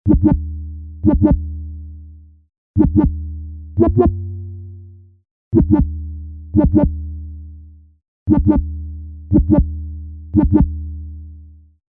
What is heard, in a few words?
wubs, Melody Loops; TRUTH-HZ; Crowdsourced Sample Pack